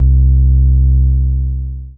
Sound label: Dance Floor Bass, Multisample Basses